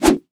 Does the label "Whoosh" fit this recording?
Yes